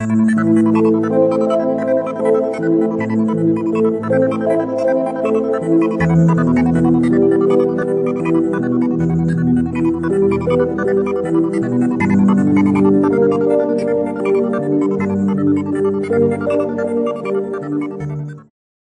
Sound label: Dual Delay Cosmos Echo Panning Dry; ClonEchoes; Syntheway